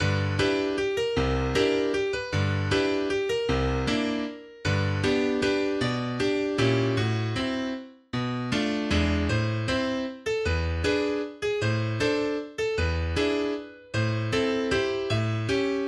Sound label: Waltz, MIDI